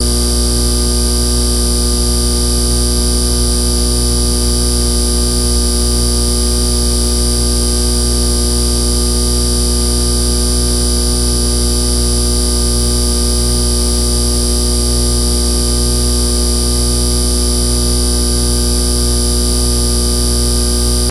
Fluorescent Light B, buzz & hums

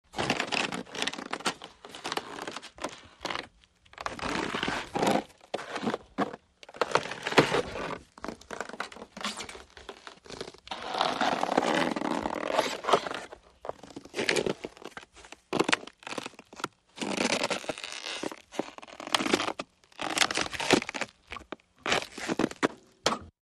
Stdy Ice Creak Crunch, exterior; creaks exterior

